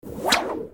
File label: Gnarled Torch Spin